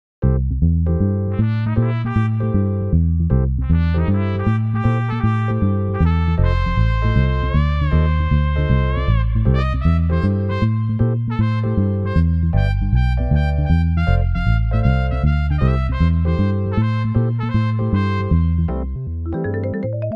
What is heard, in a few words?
MIDI blues